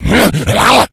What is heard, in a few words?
rage, infected, male; Left 4 Dead 2; Source Engine